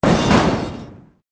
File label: metal kick; Soldier Of Fortune II Double Helix; XBOX